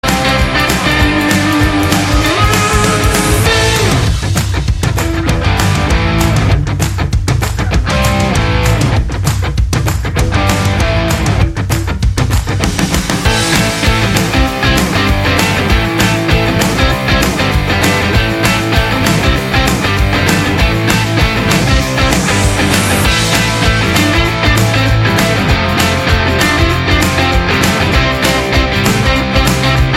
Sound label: Upbeat Rock